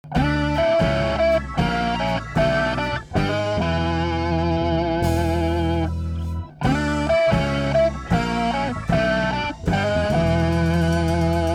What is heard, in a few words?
3min shuffle